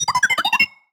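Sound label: Sci-Fi Droid Robot Emote Beeps; JASEM: Just Another Shooter with Electronic Music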